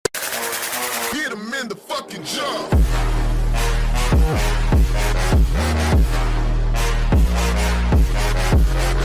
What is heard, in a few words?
bass boosted intro music